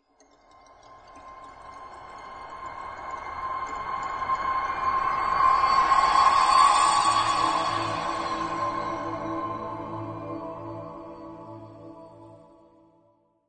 Ice Logo

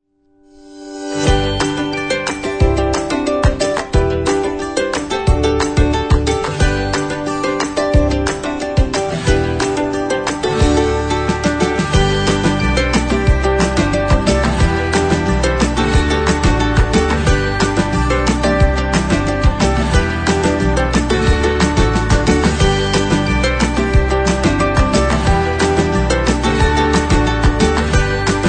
Happy Energetic Upbeat Indie Corporate Fun Short

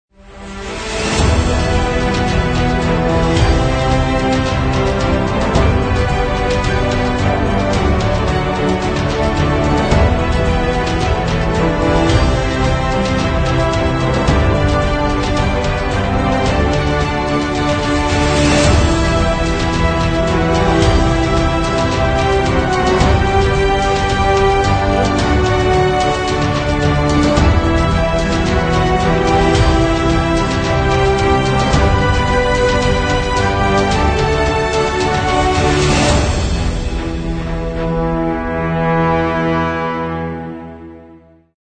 Epic

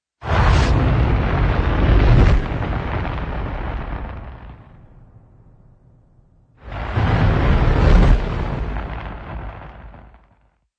Eternal Flame Logo Fire Only

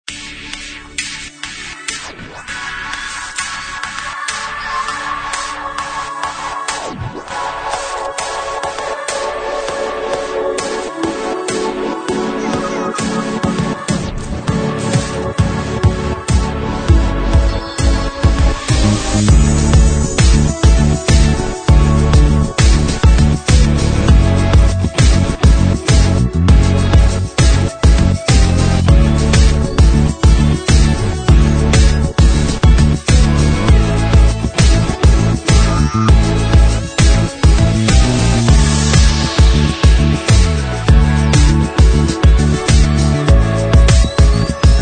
Fashion Bizarre Triangle

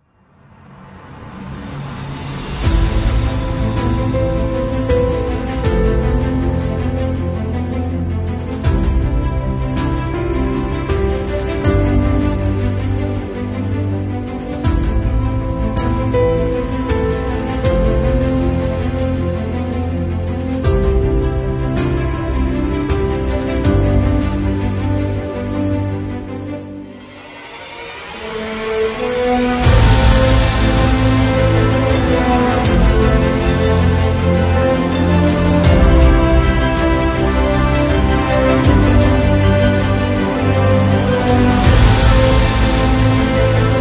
An Epic short

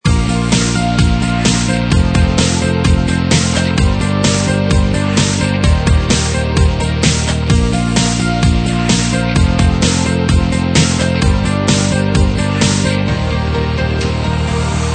Summer Days Short Loop

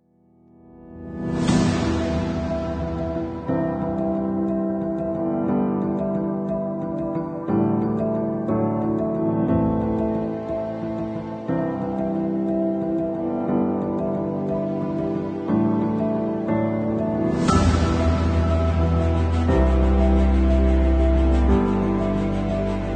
Epic with Orchestra Choir

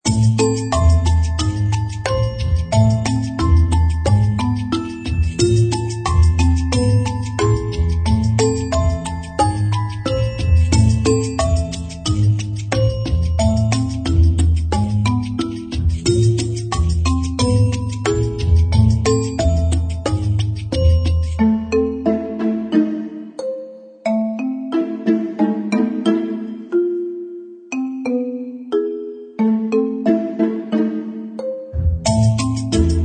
Sneaky Mischief

